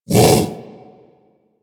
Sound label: Predasite Vocal Bark; Warframe; Hunting Critters